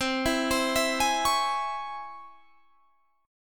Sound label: C sharp Minor Major 7th, chord